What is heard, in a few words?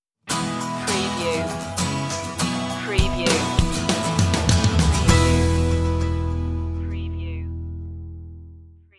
carefree stinger